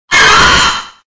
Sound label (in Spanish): Grito de Cursola